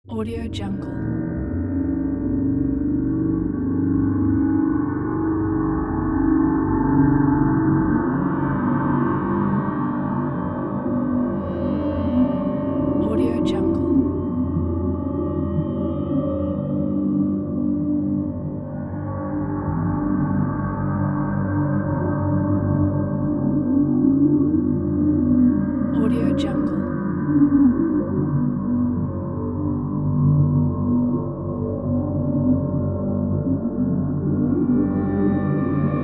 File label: dark space atmosphere